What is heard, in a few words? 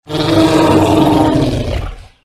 allosaurus roar